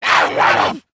attack, zomb kid; nmr

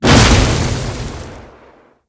impact ground light

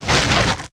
drag

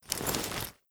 foley medium, weapons; cw foley

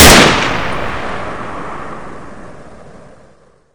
aug, weapons; pac3; F6 Modular

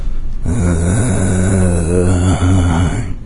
zombie idle; doom3; fatzombie

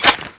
clip in; fg launcher; tfa cso

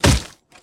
step walk

